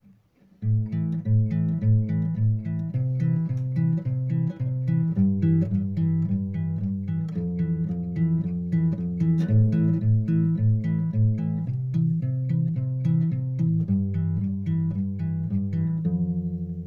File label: guitar; STALZONE; customnpcs; stalker